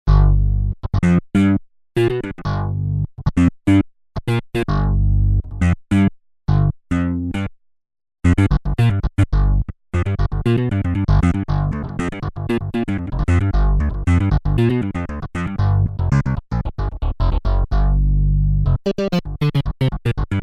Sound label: slap bass, Korg DW-8000; Factory Patches